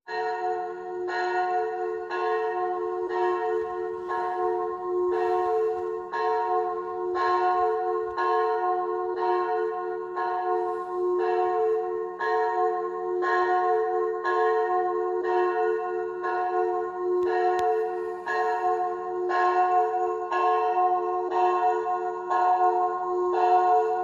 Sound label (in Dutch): Kerkklokken geluid; Atelier De Helling; luxe kerstkerk